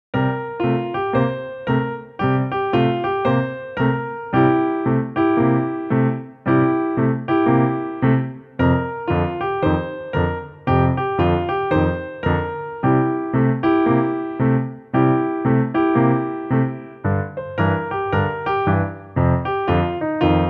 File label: BLUES